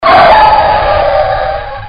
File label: screech